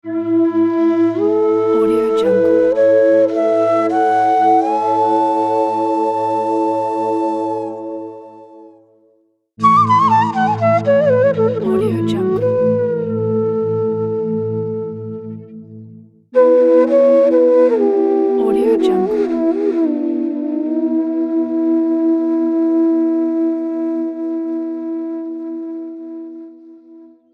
indian flute logo pack

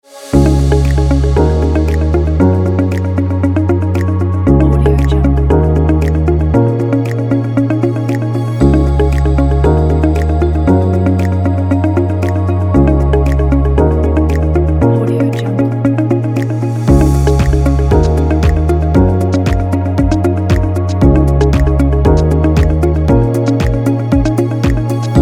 corporate upbeat; summer beats

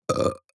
human mouth burp short; smartsoundfx

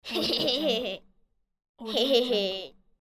nasty boy laugh

